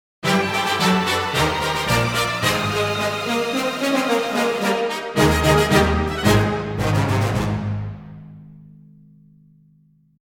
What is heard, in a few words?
British Victory; Screaming Steel